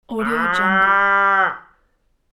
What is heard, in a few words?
cow moo